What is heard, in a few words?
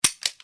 Empty Clip